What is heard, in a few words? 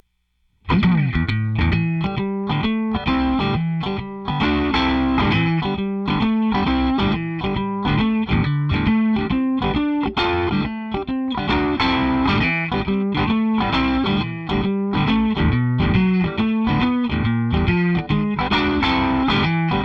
legend em12n bluesy strat; eminence